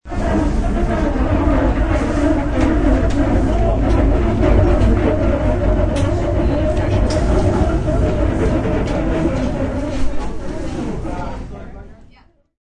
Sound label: Rolling of a caterer's cart.; Caterers Cart Rolls; Objects